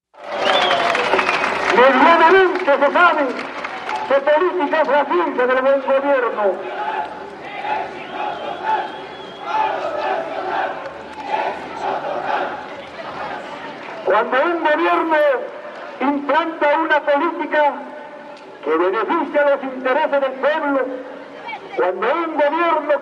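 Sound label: Political Rally Crowd Walla, spanish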